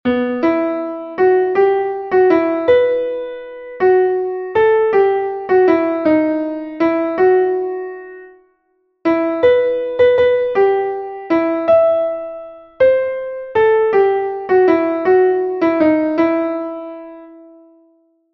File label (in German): klavier; das laub faellt von den baeumen; melodiemeister